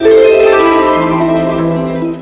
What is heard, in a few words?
drip harp